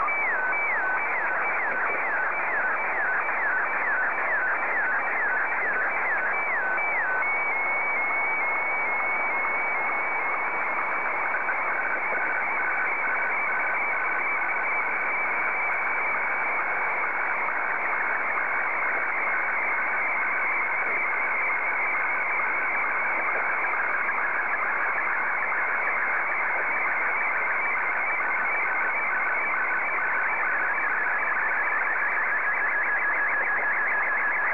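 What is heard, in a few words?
SSTV, Martin 1; ZL2TJM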